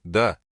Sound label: song; skibidi trash